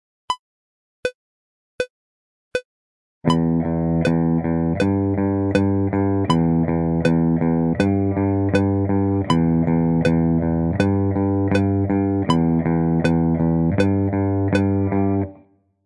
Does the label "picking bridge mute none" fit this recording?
Yes